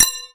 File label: ting TING